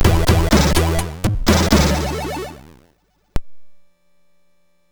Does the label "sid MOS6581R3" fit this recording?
Yes